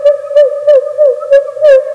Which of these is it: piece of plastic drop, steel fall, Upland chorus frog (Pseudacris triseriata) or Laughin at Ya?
Laughin at Ya